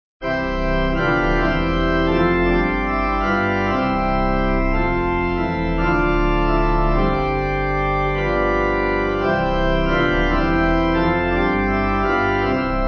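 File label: Pipe, Pipe Organ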